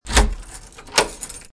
unlock

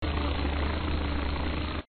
Loader move, truck; coolmathgames

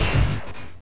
crash; madcars2; radicalplay

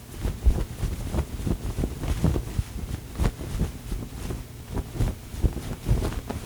flag flap; pond5

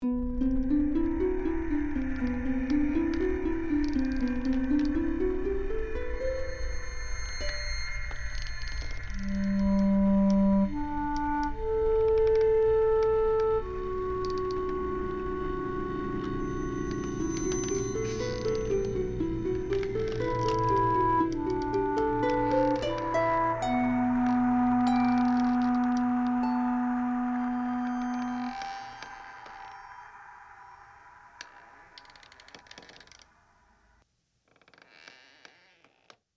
music game

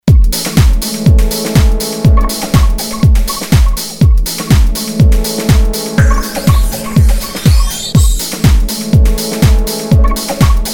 BGM House

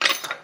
metal clink; 2fast2curious; fieldgoal

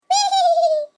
happy; Flashpoint; tobiidynavox; catchme

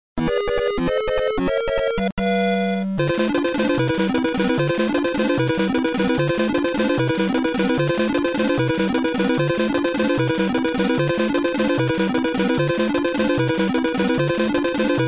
Hurry Starman

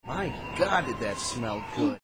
Ending

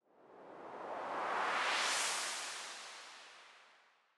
swoosh rise long; CrossCode

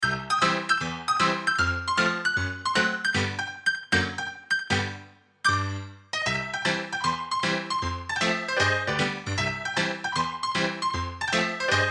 ragtime

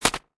grab

